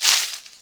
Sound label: hit bush short; GTLive